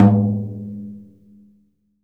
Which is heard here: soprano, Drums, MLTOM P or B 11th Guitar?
MLTOM P